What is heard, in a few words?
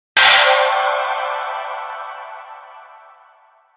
Hit Pixie Dust Burst; 5.1 STEREO MIX; Blastwave